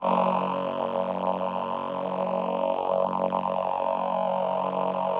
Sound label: VP-330, String Machines